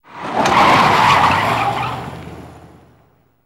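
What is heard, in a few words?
Cadillac 72 Corner Skid; Cadillac 1972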